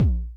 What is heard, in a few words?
kicks, Bass Drum